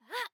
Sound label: Grunt Jump; Fairy; Paladins